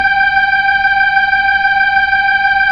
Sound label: B-3, Keyboards; Keyboards of The 60's and 70's; Full Xfade